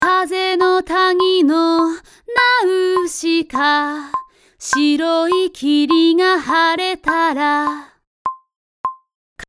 Vocal

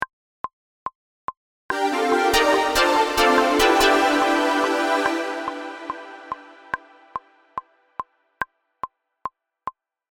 Intro chord Rev

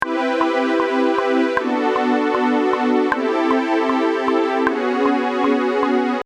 Strings

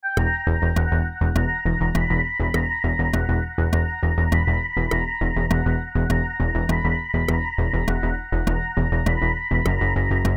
Pad

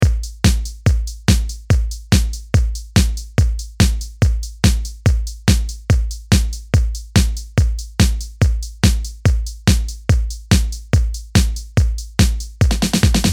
Drum

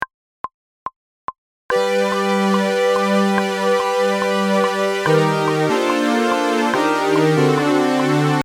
Strings

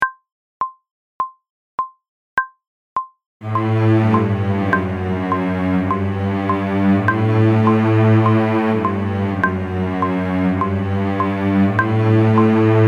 Low St

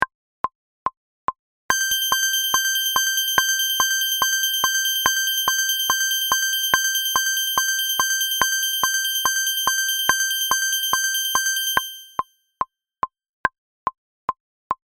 Intro Seq

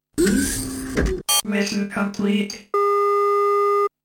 Close

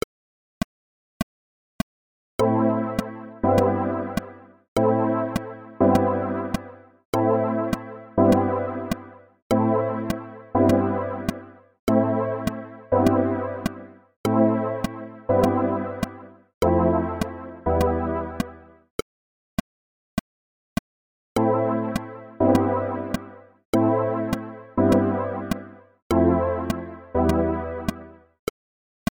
Pad

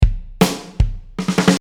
Drum

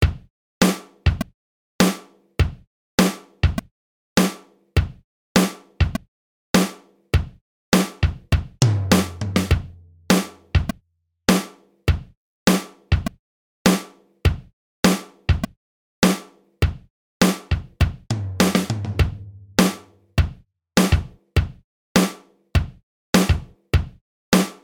Drum

